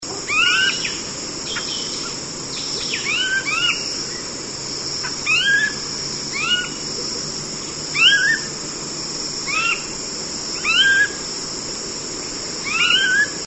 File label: dusk